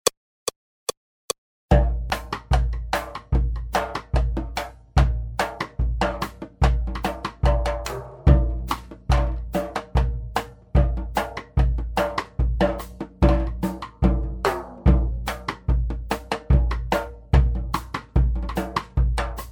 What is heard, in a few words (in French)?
Accompagnement seul; Scott Joplin; SWIPESY